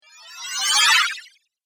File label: Magical Transformation; Magic Sound Effects